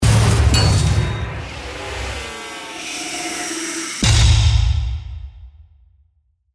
Composition Metal, Production, Element, Composition, Metallic, Slams, Feedback, Descend, Whoosh; SoundEffects-BlastWave